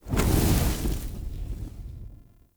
Torch Puzzle Fire Ignite; Puzzles

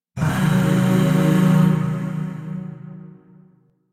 Shawzin Blues Chord D; Chords; Warframe; Zariman; Void

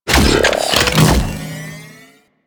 Deployable Cover Deploy; Corpus

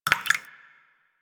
Water Drips; Warframe; Quests; The New War; Ambience; Zariman

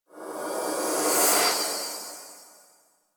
Transition Fade Swell; Archon Intro

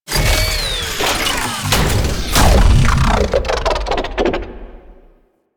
Mech Death; Nechro Mech; Vehicles

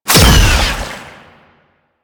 Slinky Dead Zone Cast; Warframe; Man In The Wall

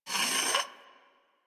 Leg Movement; Enemies; Warframe

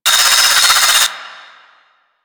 Sawman Shot Loop; Attacks; Ghoul; Warframe; Grineer Ghouls

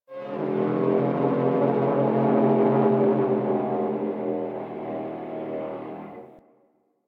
Venus Landscape Distant Horn; Ambience; Warframe; Venus Open World

